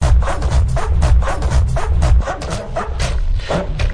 120 tekswing, DRUM LOOPS and BREAKS; 111 to 120 bpm

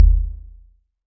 click thick, kicks; single hits

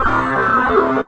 telemetry bass; Basses

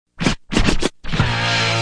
cheesy 80s scratch hit; Scratching and Turntablism; DRUM LOOPS and BREAKS

